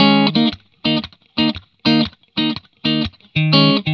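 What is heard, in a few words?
120, Vintage Blues Guitar rhythm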